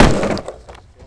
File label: land wood; terrains; Tony Hawk's Project 8; XBOX